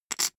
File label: poker chip stack small rattle short wobble